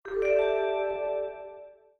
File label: sys Ok Ringing